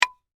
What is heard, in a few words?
Tonfa Strike Impact, Warfare, Tonfa, Strike, Impact, Wood; Swords; Combat